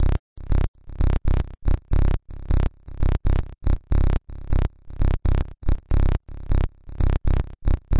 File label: 120 super narrowpass bass